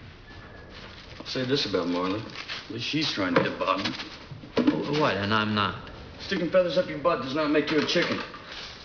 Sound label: chicken